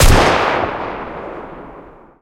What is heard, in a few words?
m3 last shot, guns; War Thunder